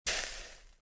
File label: rain fizz; weapons